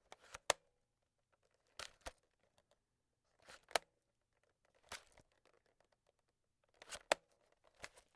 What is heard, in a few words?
lens adjustment, tools